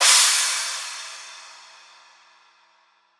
Crash; Cymbals; Ghosthack; Color Bass Essentials

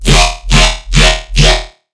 Riddim Bass Hit E; One-Shots; Bass Hits; Trench; Ghosthack; Riddim Essentials Volume 2

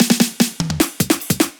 Dubstep Fill, 150bpm; Drum Fills; Ghosthack; Dubstep Sound Arsenal

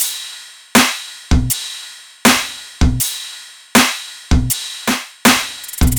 Top Drum Loop 160 BPM; Cymatics; Light Free Sample Pack; Bonus Samples

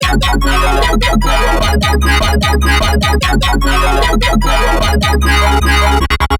Melodic Bass Loop F# 150, Color Bass; Loops; Infinite; Ghosthack - Color Bass Essentials

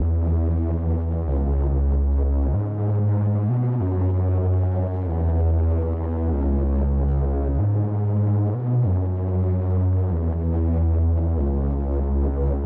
95 BPM B Min Bass, Latin; Melodies; Loop Stems; Cymatics; GHOST (Beta Pack); Aventador

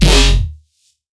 Riddim Bass Hit F#; Tronics

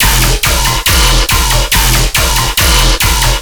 Bass Loop E 140BPM, Dubstep

